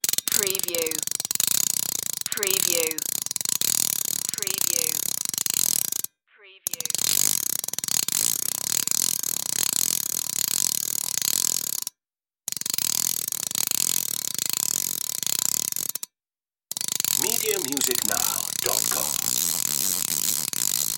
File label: turn a little crank